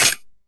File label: headshot